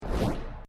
heart up; gunknight

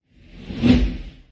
asteroid; crossyroad

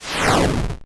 swap screen; thereisnogame

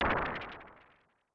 asteroid hit; adarkroom

